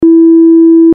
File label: Tone; audio activated switch lightning machine